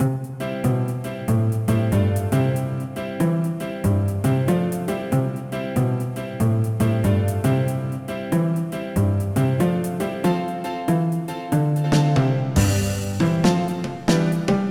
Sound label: blues